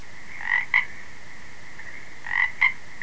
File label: Treefrog